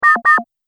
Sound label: Multimedia Double Button